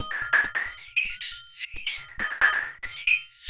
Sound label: SAD SWEEP L; Spectrasonics; Metamorphosis